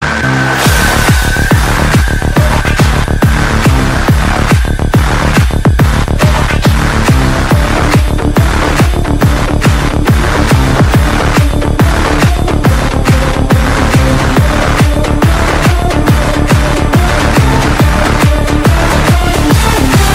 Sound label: Subnautica Soundtrack